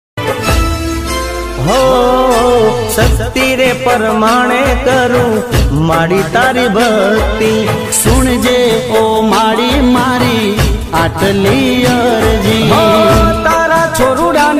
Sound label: Gujarati Garba ringtone; jay ambe maa